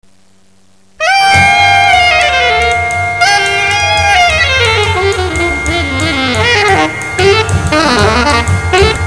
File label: jazz